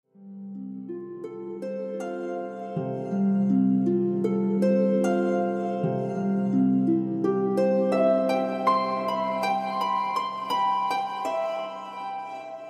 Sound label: Harp